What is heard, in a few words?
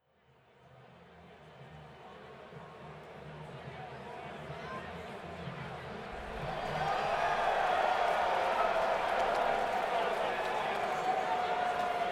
Crowd, Saratoga Performing Arts Center; Saratoga Springs, NY